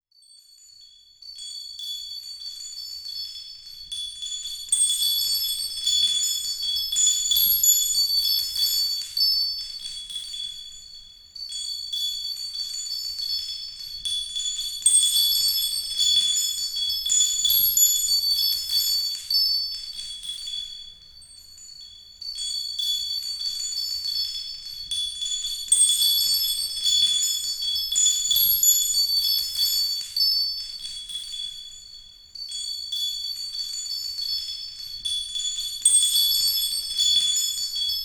Koshi Bells

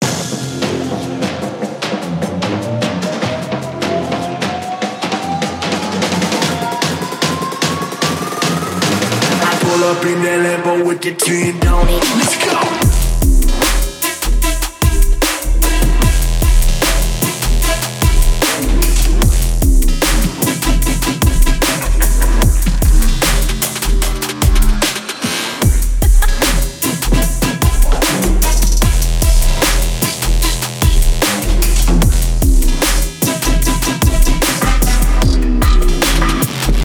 HYBRID DUBSTEP; Fl Template

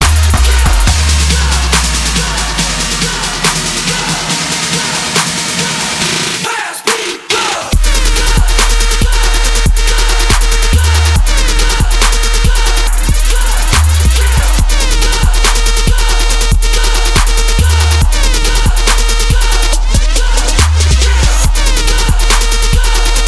Trap

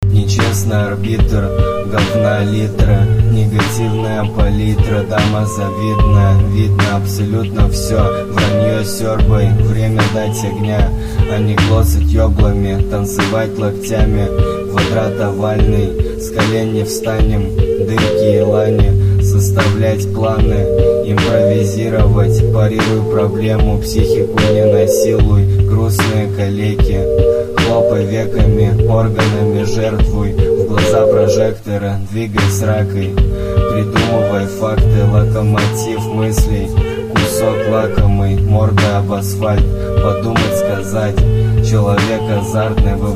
zip hop

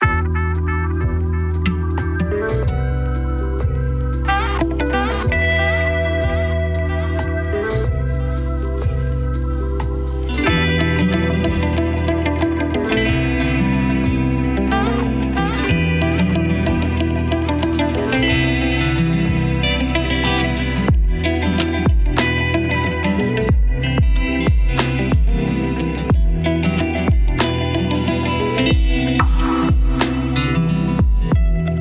Guitar Version

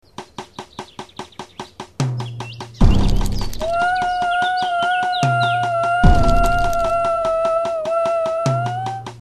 Howl

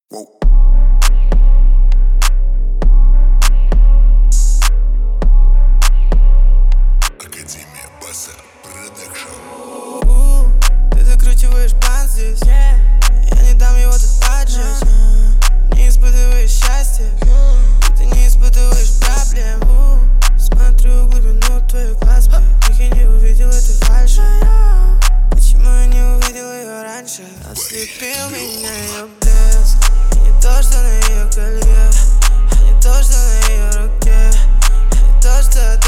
33-37Hz Low Bass

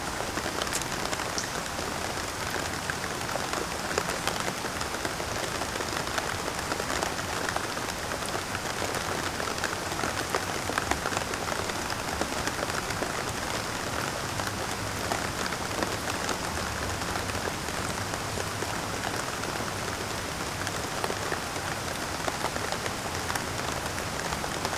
Soothing White Noise for Infant Sleeping

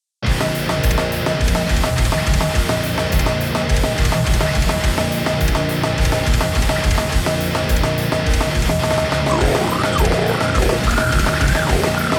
Groovy Goregrind Porngrind

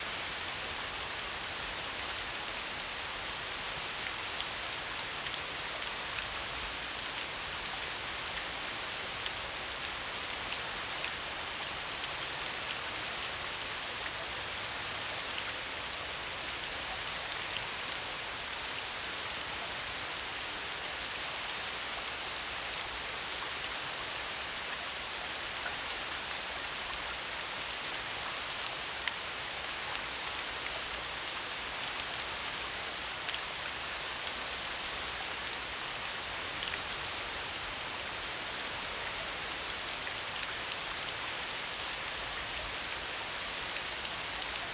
Rain Sounds, Swirling Puddles

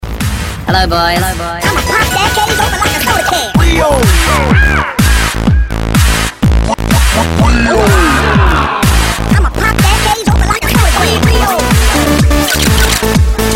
Commercial Music; Kia Rio iz reklamy avtomobilya

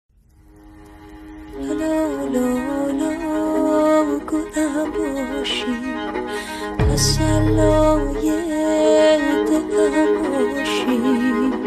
Ethnic Musicians

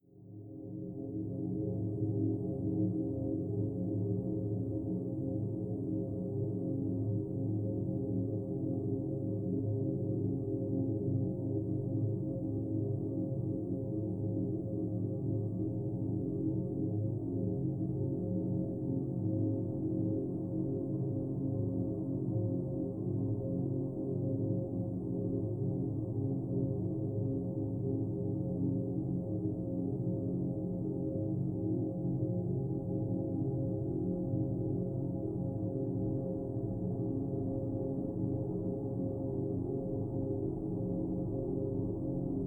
Rain Sounds

